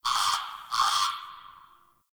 Tree Critters Scared; Warframe; Duviri; Ambience

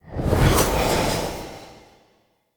Soul Ability Rage Energy Full

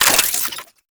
Modular Secondary Handle Slow Reload, Guns; Warframe; SU Modular Guns; Reloads

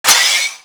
Lantern Power Up Short; Warframe; Emissary Season Two

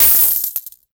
Fist Claw Coin Impact; Weapons; Warframe; Tenno; Incarnon

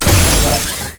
Service Door Open; Con Junction; Warframe; Entrati; Ambience

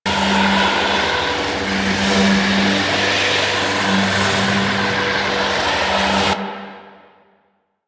Super Weapon Energy Loop; Warframe; Vehicles; RailJack; Proc Ships; Corpus

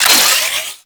Orbital Strike Augment Charge; Trapper

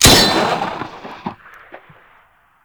Grineer Pursuit Space Ship Mine Ability; Abilities

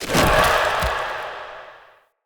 Mockery Foley Husk Enter; Enemy; Mockeries; Characters

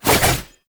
Staff Swing Spin Sweetener; Warframe

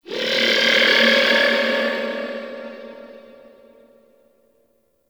Pursuit Space Ship Call; Warframe; Grineer; Vehicles

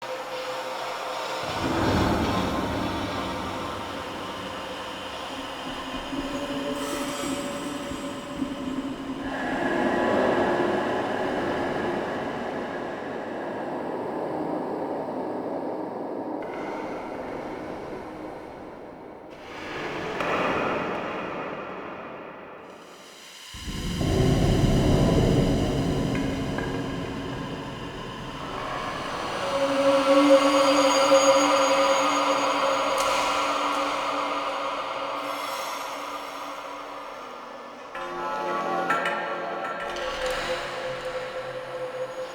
Ambience Loop; Under City; Silent Rose

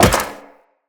Wall Jump Metal Perforated, Footsteps; Wall Run; Characters; Tenno

